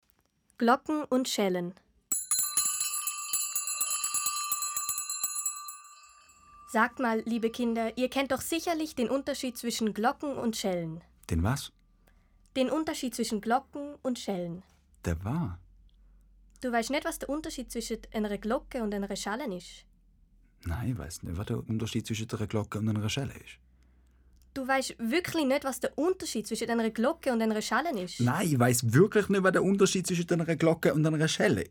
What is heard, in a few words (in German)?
Glocken und Schellen